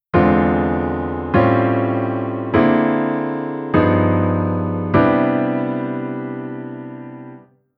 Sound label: Chord Tension Ext Dom